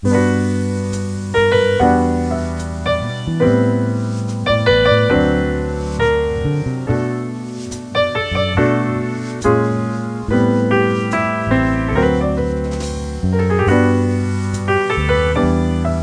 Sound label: musc